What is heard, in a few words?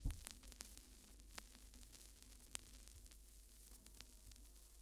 Vinyl Crackles